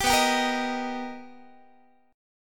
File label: B Augmented Major 7th B down Guitar; B+M7; strummed; 22,19,22,20,19,x; 4A,4E,5A,5D,5G#,6C#